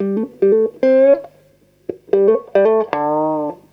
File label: GTR1, Roots Disco; USB Soundscan vol.32; AKAI